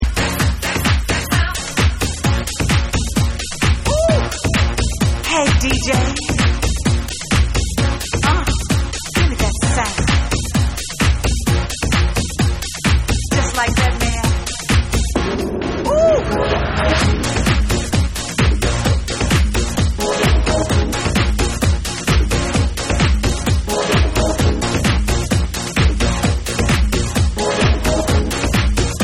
THAT SOUND Dark Sexy Dub

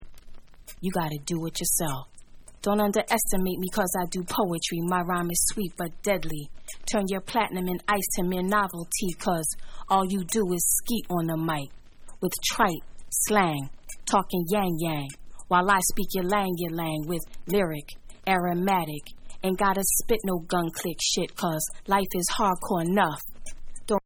Acappella

